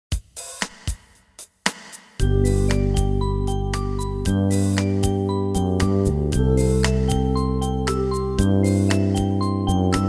Music Box Etude

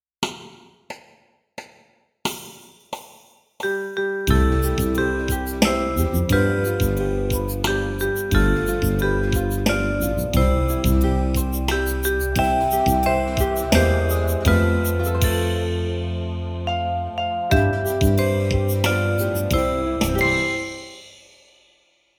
Happy Birthday Slow, piano

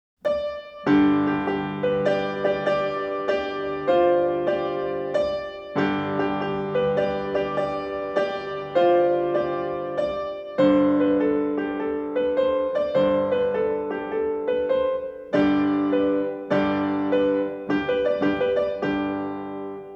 piano

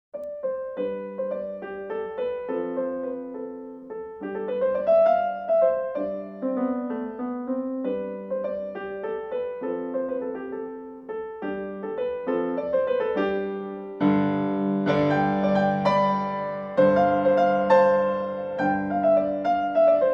piano; Treasure Island

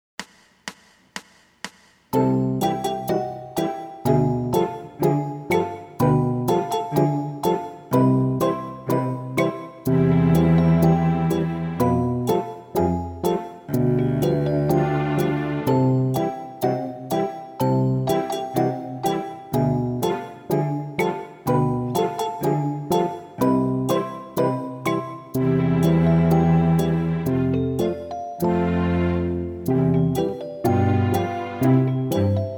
Broken Chord Patterns, piano

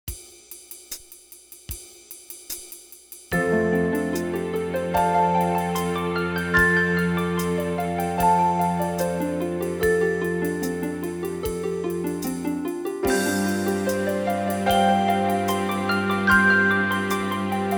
Chord Etude Slow

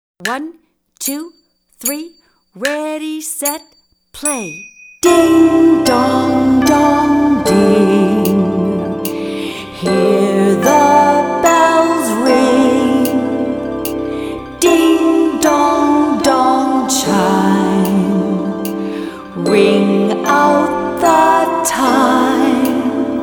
bells; scotland

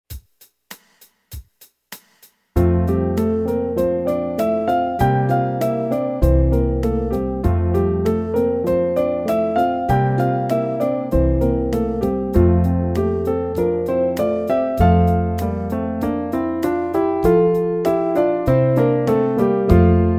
Scale Etude In F Slow, piano; Op. 101; Beyer

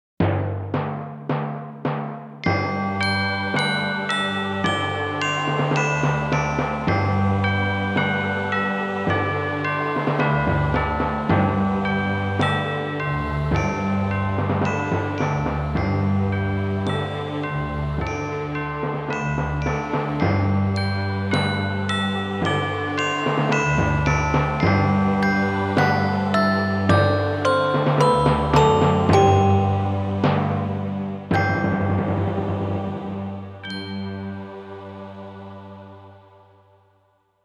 Bells On A G Scale

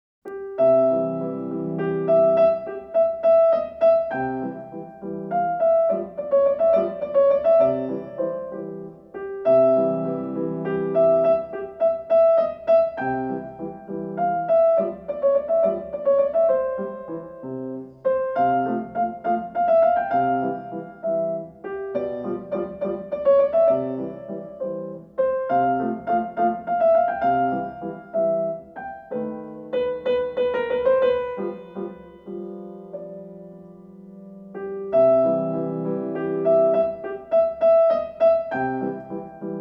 Aria piano; La Traviata

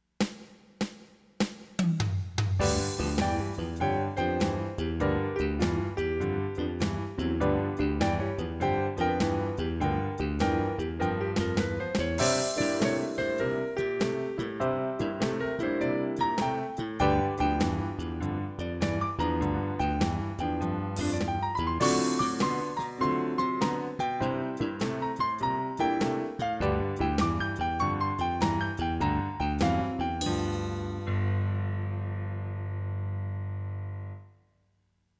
Barrelhouse Blues in G, piano

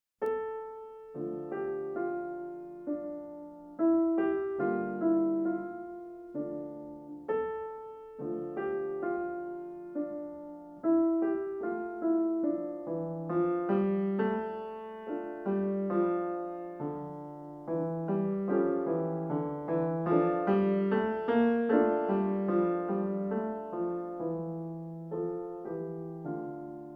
Gypsy Dance by Firelight piano

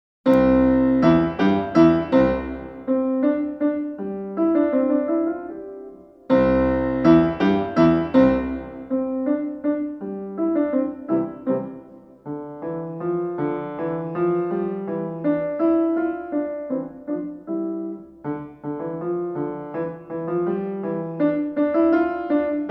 Concert Sonatina Allegro piano

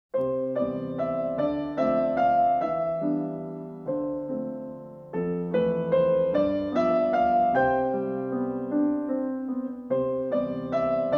Eternally Music piano

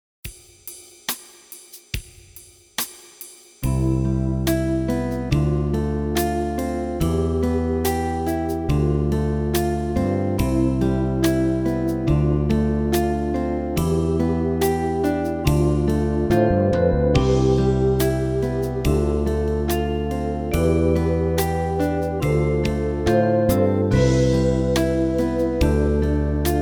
C Pentascale Improvisation Slow

